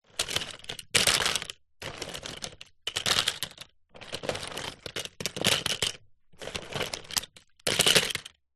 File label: jigsaw puzzle pieces search through carpet